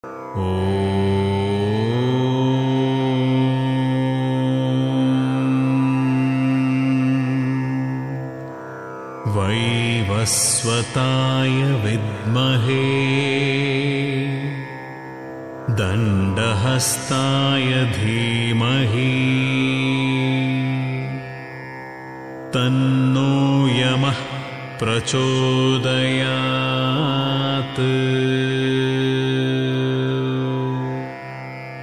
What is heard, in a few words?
yama gayatri